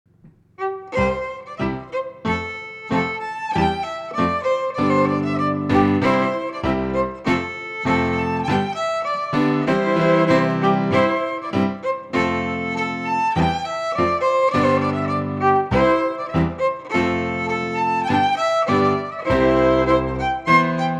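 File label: Quick Step, Scottish Fiddling; Morrison 1797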